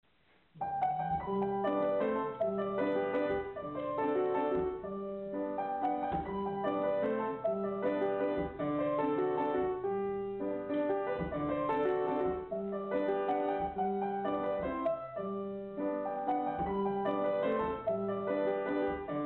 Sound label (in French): Valse favorite rythme plus lent, piano